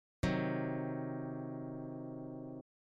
c dim triad, guitar; C diminished

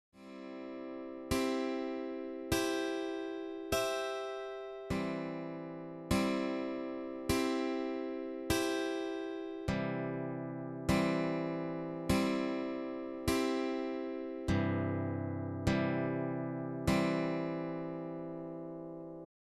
triads practice, guitar

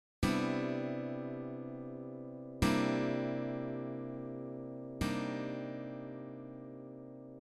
CMaj9 C9 Cm9; Chord Extensions

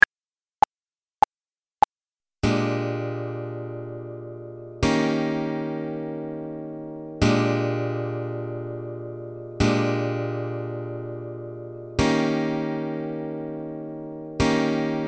12 bar blues example, guitar

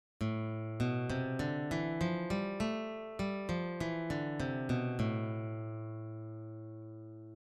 a minor scale, guitar

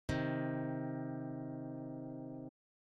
intervals harmonic minor third, guitar

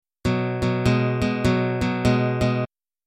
b5 to b6 shuffle; 12 Bar Blues; guitar

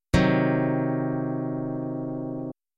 c diminished; chord contruction; guitar

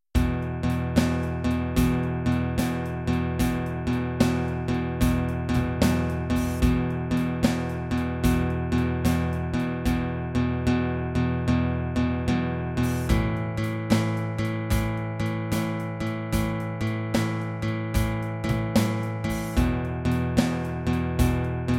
12 bar blues shuffle in E with drums, guitar

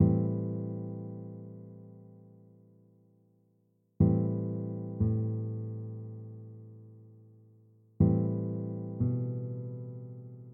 aeolian vamp; modes

